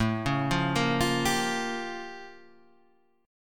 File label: A Augmented 7th Flat 9th A; arpeggiated; 1,3,sharp5,b7,b9; JGuitar